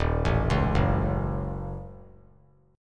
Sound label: D sharp Minor 7th Flat 5th D sharp, down; D#m7b5 arpeggiated; 3C,3F,3A,3D